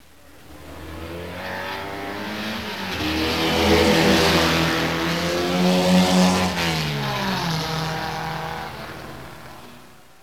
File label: Car; washington state 914s